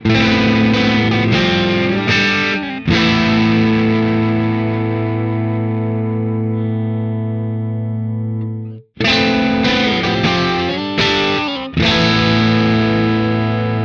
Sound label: Schecter C1 Apocalypse